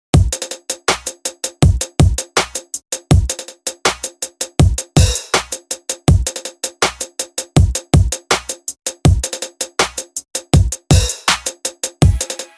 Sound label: bpm 80; ultimix vol 288; kwikmix by mark roberts